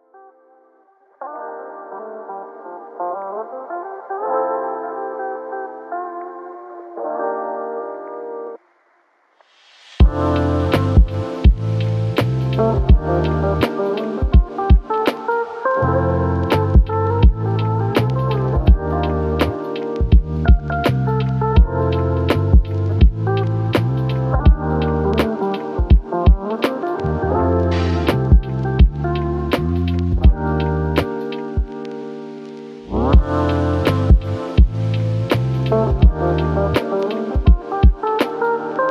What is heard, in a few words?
lofi cafe